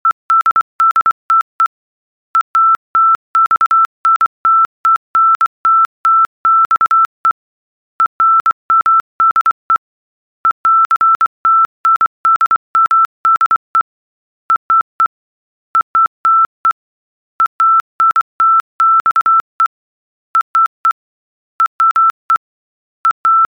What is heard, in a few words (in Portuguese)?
ritmo velocidade